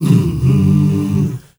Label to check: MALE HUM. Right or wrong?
Right